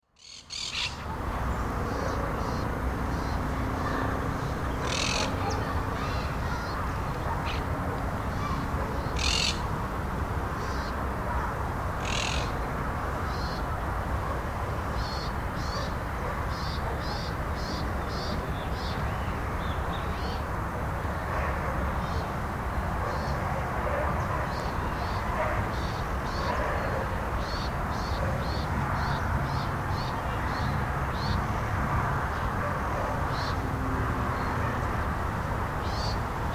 calles; edmundo rivero

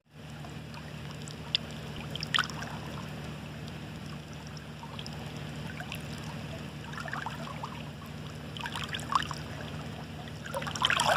lago traful; neuquen

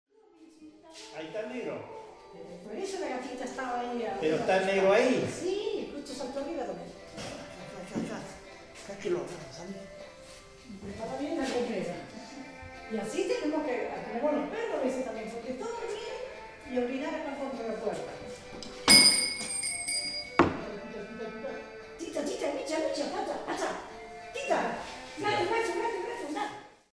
bar de campo; santa maria norte

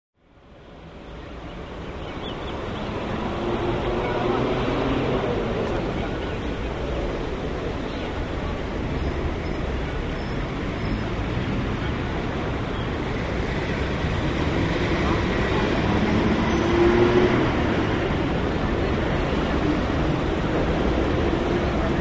plazas; juan domingo peron

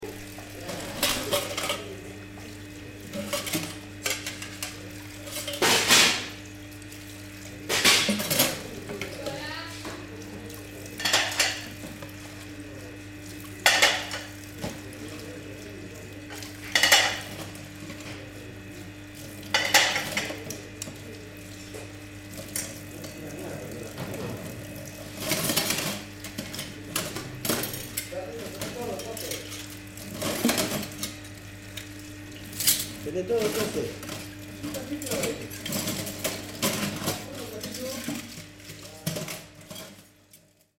bares restaurante; ricon hispano; cortada ricardone

